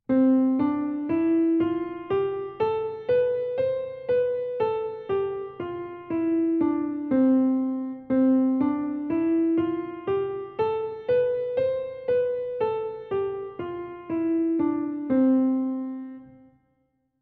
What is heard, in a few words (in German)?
C Dur Tonleiter Übung